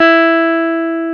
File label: GTR, Jazz Guitar; 90's Sample CDs; Club-50 - Foundations Roland; Jaz-Kessel